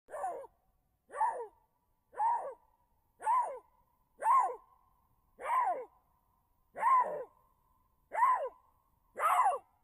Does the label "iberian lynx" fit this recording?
Yes